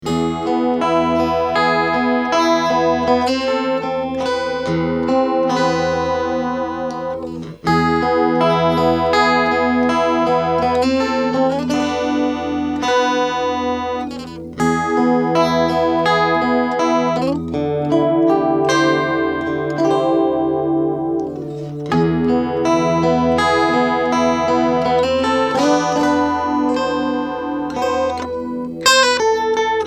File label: Detune